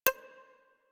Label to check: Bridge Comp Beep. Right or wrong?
Right